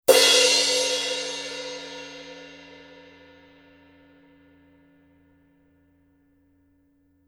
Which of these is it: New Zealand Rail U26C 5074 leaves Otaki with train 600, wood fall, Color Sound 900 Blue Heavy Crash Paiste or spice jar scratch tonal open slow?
Color Sound 900 Blue Heavy Crash Paiste